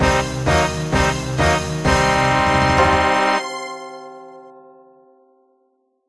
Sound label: Chest Looted!; Barrows